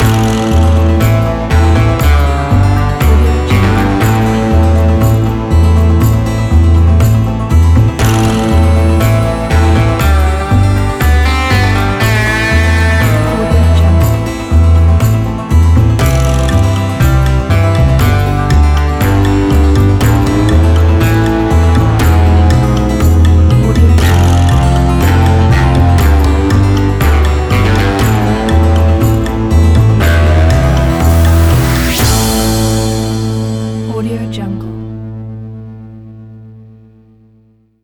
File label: western intro